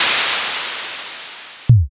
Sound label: airlock